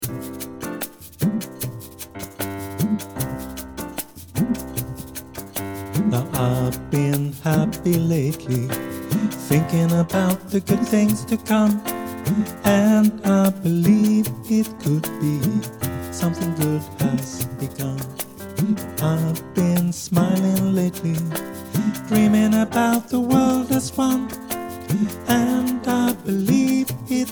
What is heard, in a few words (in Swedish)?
bas